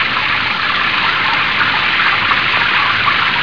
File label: water; de torn